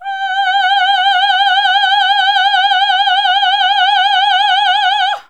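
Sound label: Classical Female G4, choir; Best Service Gigapack 1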